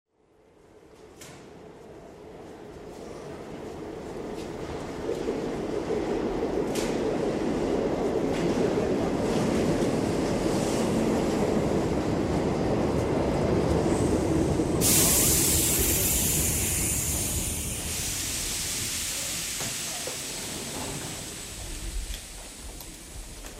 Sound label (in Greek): Mesa sygoinwnias Metro